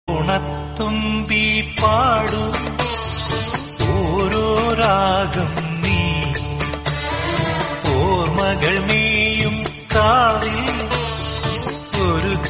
romantic remix malayalam

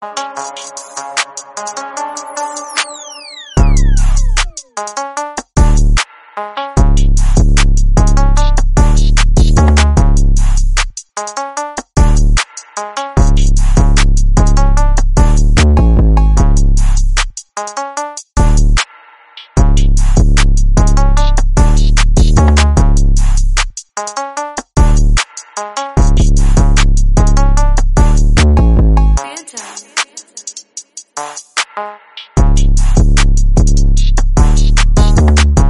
oriental dancehall type beat instrumental song

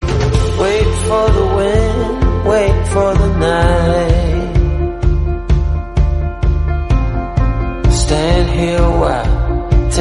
english song

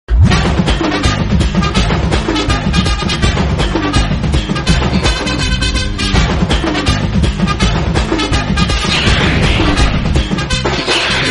flute music; rajinikanth; narsimha movie

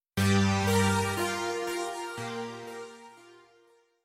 trap remix instrumental; stranger things 3

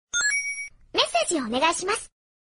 japanese message notification

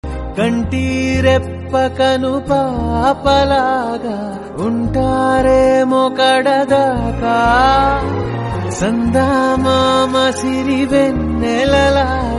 telugu song

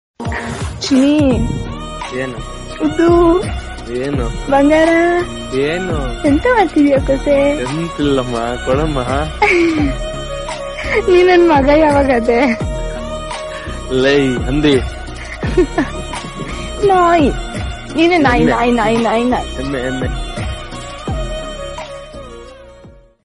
songs

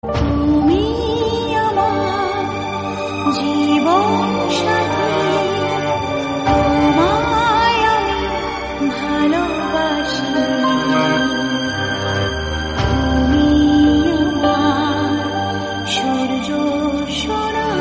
female voice bengali song

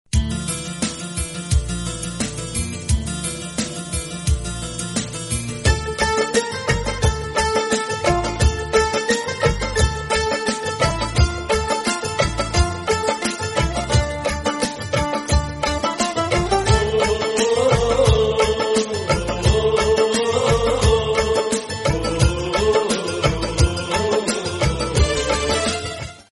flute

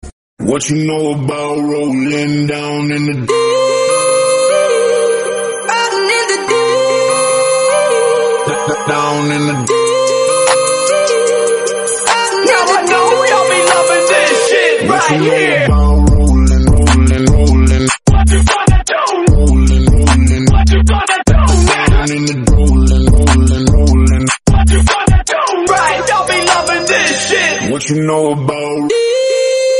remix

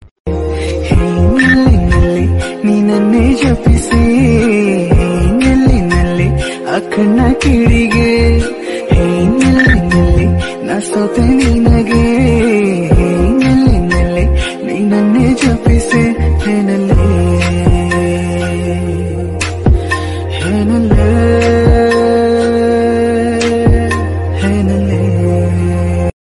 romantic, kannada

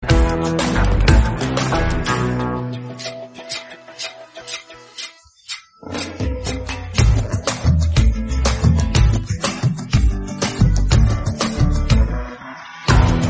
Instrumental

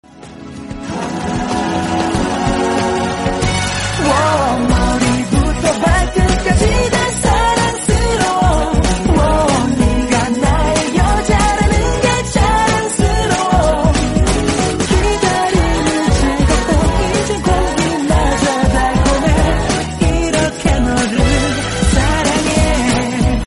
korean song; a love so beautiful ost

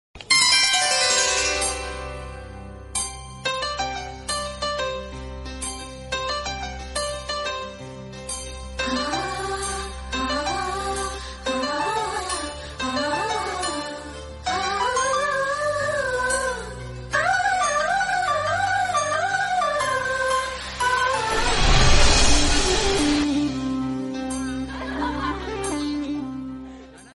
flute background music

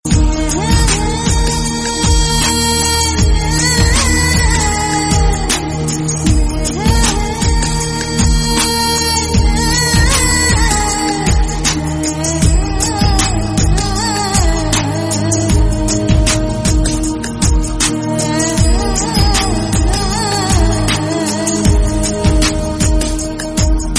love bgm theme; hridyam

